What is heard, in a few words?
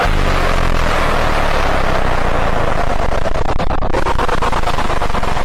toilet screaming